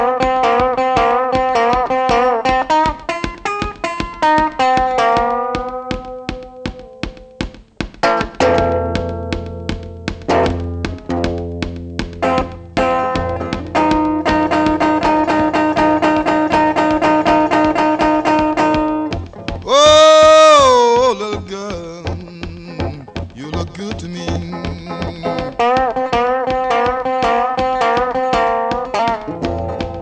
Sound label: blues